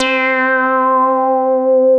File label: Lead, Synthesizer Middle C Notes